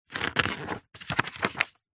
household book thick heavy encyclopedia modern glossy page turn; zapsplat